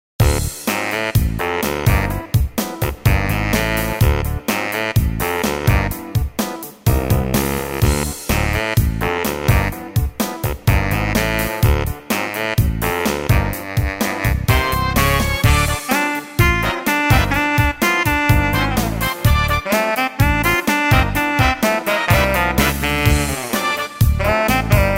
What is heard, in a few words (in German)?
Bariton Sax